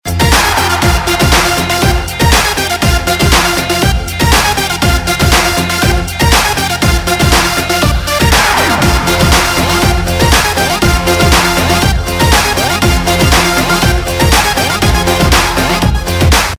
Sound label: Remix, Music, Bollywood; Tanu Weds Manu Returns